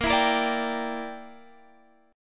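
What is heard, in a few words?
A sharp 6th Flat 5th chord, Guitar, Half Step Down tuning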